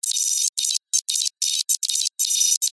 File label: science fiction data read out print screen